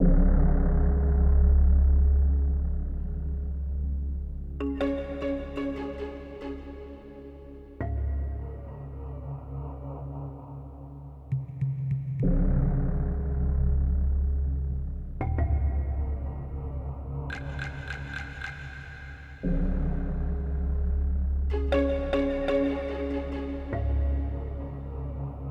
Fossil World ambience; Lode Runner The Legend Returns

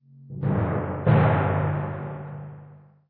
Tymp Hits

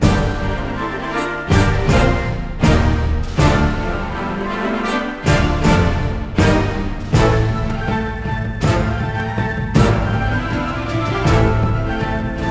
Battle Swamp; How to Train Your Dragon

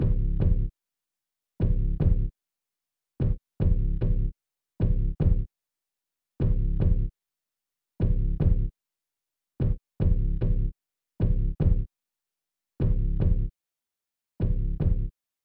Prowling the Grounds (Sneaking)

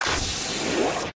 Raygun; criminality

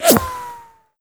bullet flyby slow; gamerip; shattered horizon 2009

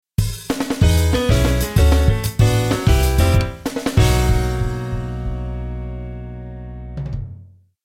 Clear jingle; pretty girls breakers ps4 ps5 switch gamerip 2022

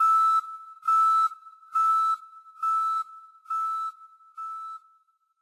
Viking ship Retreat

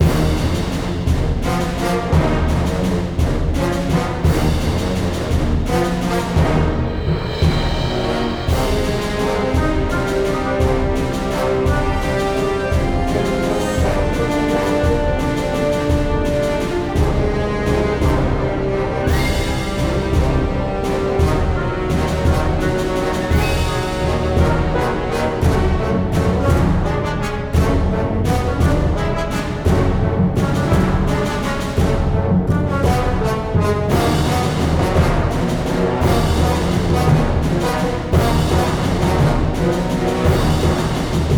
Battle Hard Attack

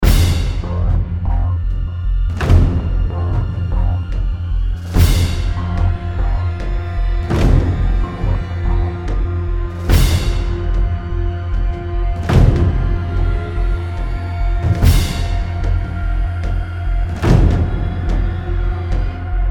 Battle Loop; Infinity Blade 2

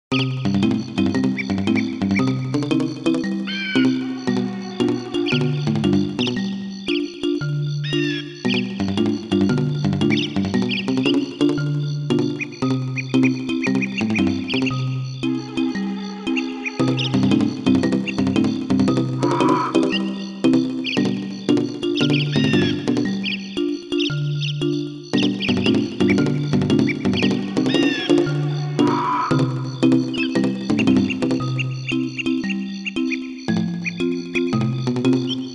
Inside The Treetops - Summer; Banjo-Kazooie; Click Clock Wood

